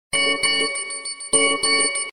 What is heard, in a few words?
Nokia Default Alto